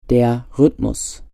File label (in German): Rhytmus